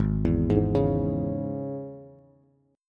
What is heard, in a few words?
Ab Suspended 4th Sharp 5th Bass; arpeggiated; JGuitar